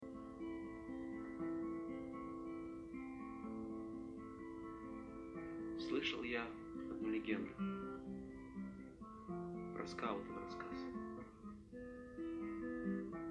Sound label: unplugged